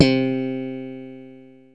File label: bass